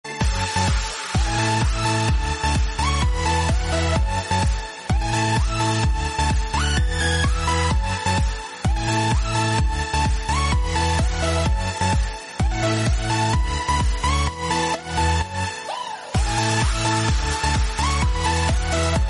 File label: countdown music instrumental music